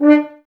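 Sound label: Brass French Horns D; Sample CDs; Miroslav Vitous; Woodwind Brass Ensemble; F.Horns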